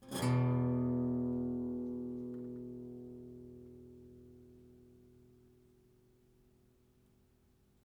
Batiguitarra Cuerda Doble Frotada Bulon E3 B3 F; Batiguitarra Del Horror

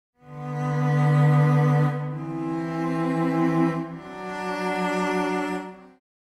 Doblecuerda (violoncello)

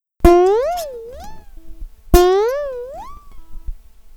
Gliss guit regla

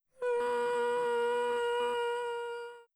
Voz tenor aireado f

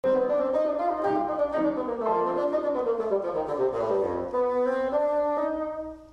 Sonido ordinario fagot

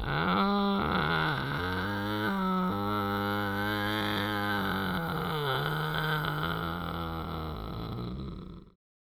Voz tenor mod mf

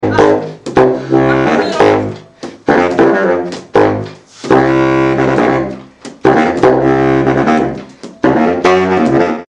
saxofon notas

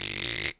Voz tenor aireado f

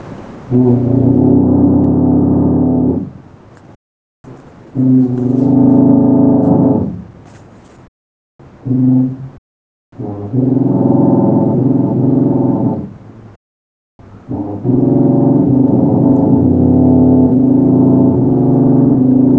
Tuba baja Ord f

